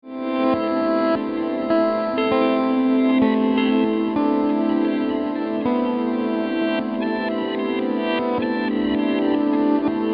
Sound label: Mood Mk2 Clock Overdub